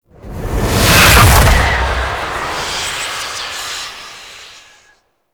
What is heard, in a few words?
Fly By Slow, Vehicles Flight; Warframe; Liset; Stalker Liset Skin